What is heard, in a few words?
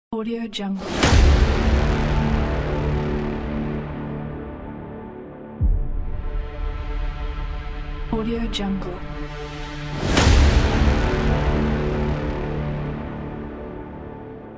epic hybrid trailer